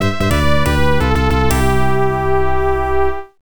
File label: Clear, msx2; Feedback